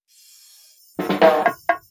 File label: Fill 128 BPM; Vengeance Samples; VEH1 Loops